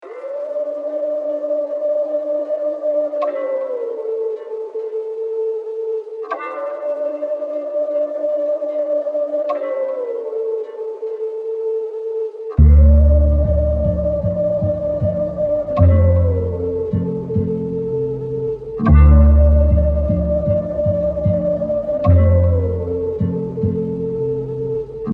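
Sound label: 153 D minor, samples; CRATES & BREAKS VOL.1 - BEEJ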